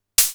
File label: hh, Polivoks; Formanta Radio Plant